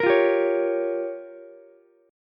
G Minor 7th Flat 5th, Ukulele; Gm7b5 strummed; JGuitar

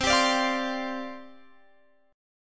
C Suspended 2nd Suspended 4th C Guitar Open F; Csus2sus4 strummed; x,19,19,21,20,19

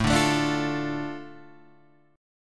A Suspended 4th Sharp 5th, Guitar Half Step Down

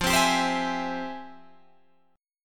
F Minor Major 9th, Guitar Open D, chord

